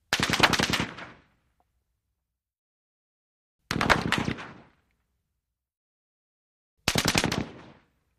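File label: AK47 Single Shots Impact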